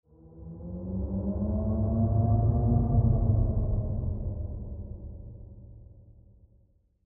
Ascend Swell

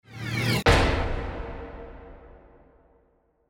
Ascend Hit

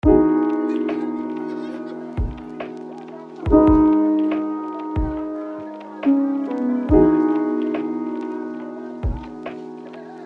Kora

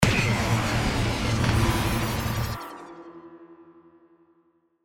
Sweeper Blast

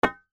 bat hit

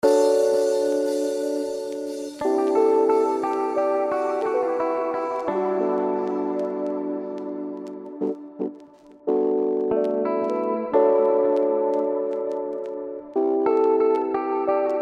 Beauty Lofi

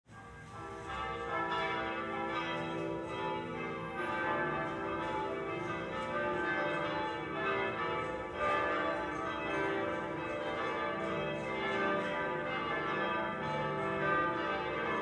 church bells

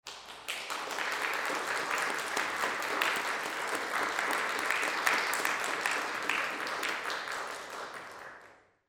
Applause Crowd

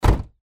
Car Door Shut

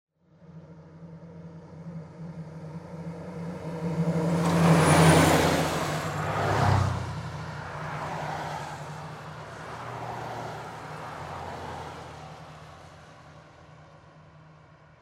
Cars Drive By

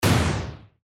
Blast

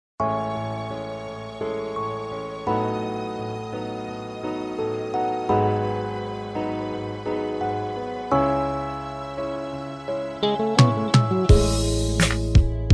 Slow Jam

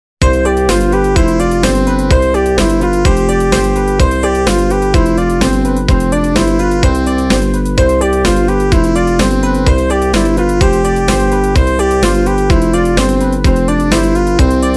Quiz TV; Soundzabound Volume 3

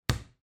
ball bounce single

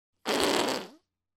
Whoopie Cushion; Soundzabound

